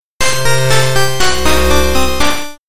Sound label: Game Over In Horror Games, Jingle